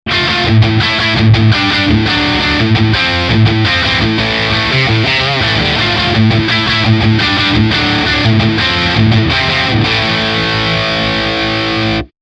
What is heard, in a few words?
High Gain Strat